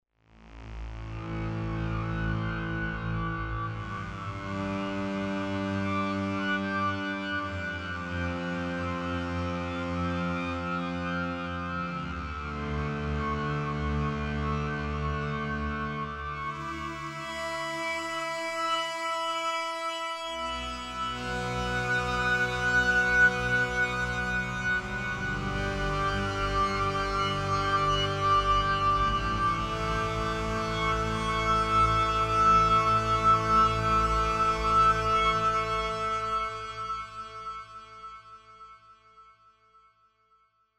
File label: Open Space